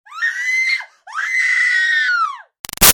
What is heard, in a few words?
Scream Female Terrified Shrill; Voice; Screaming; Big Room Sound